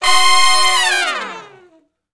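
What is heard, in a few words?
Long Falloff (Ab) harmony, Horncraft; Sections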